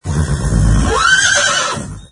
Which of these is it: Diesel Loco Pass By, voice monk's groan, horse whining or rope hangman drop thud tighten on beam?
horse whining